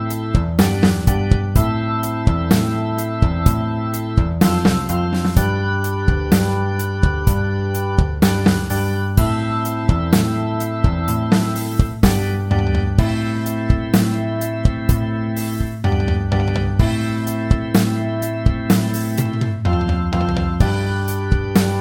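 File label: hammond b3 slow leslie speed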